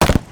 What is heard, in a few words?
nagant dist; weapons